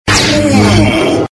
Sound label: pain